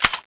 clip out; tfa cso; thanatos3

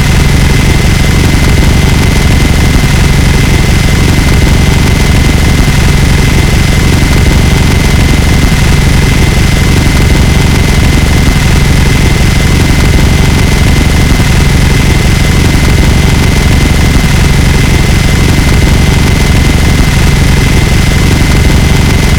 minigun fire; weapons

